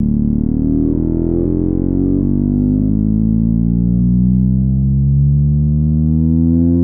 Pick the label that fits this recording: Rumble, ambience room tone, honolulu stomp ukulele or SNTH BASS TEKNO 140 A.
SNTH BASS TEKNO 140 A